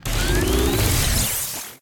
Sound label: cloner Duplicate; spacewars